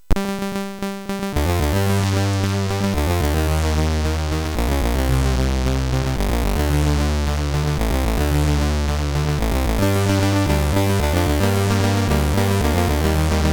Loader sid MOS6581R3

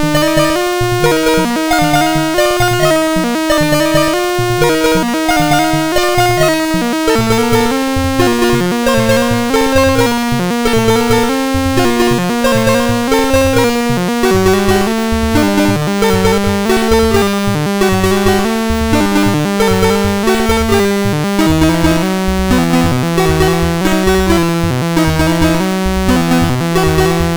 Randomly generated music BASIC, sid MOS6581R2

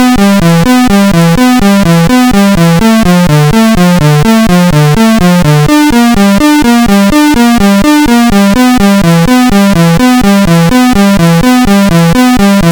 Melody, MOS6581; HVSC; 3-Tunes

